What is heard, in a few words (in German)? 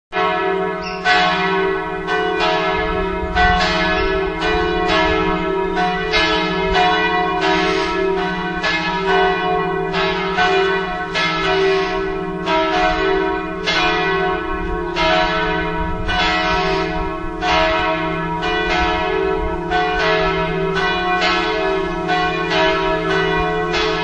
Gelaeut; Hamersleben